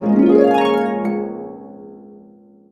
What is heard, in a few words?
harp